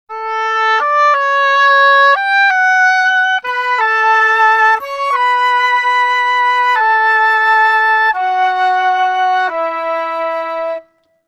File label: 90 D maj Flute+Oboe, Orchestral arrangements